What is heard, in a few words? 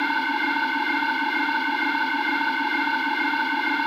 kawai k1 PAN FLUTE LOOP; wavetables